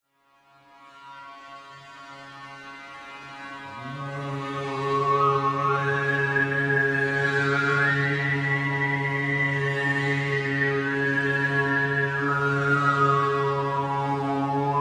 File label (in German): oberton; nicht alltaegliches